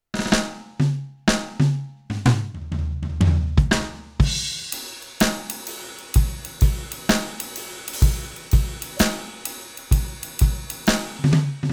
Millenium MPS 750 Jazz Kit

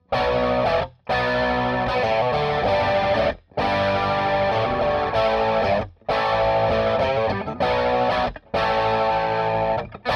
Red Special Treble Booster

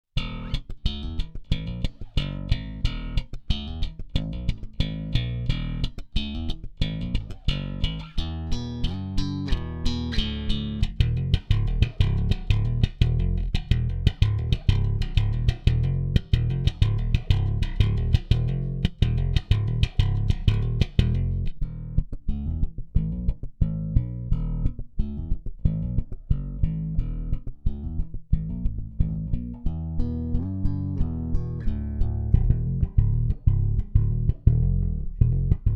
090bpm Thump

